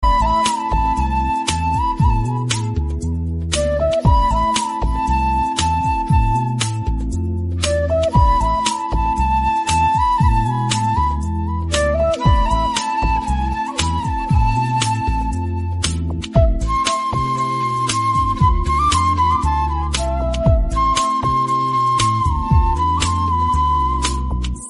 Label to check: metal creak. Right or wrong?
Wrong